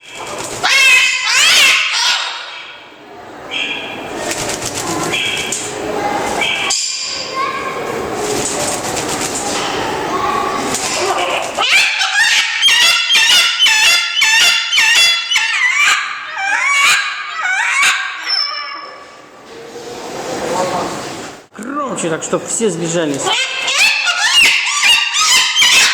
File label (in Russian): molukkskii kakadu oryot; severskii zoopark